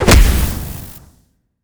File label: Damage; helltaker